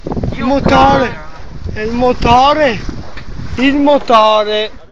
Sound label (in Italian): il motore